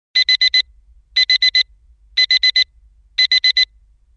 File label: Beep